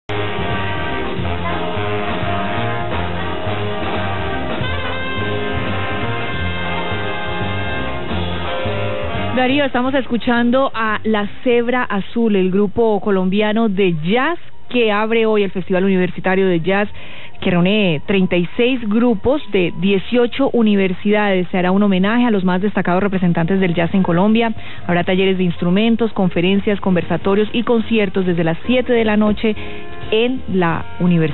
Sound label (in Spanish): jazz